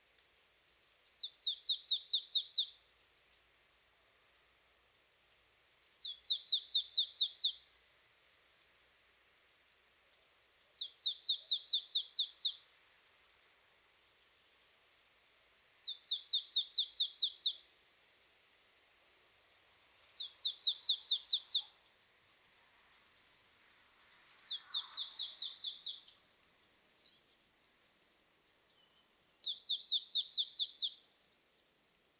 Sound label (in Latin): Poecile palustris; SETE